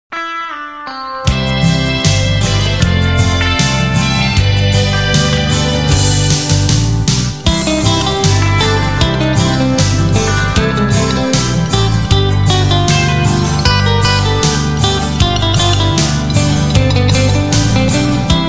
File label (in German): country traummelodien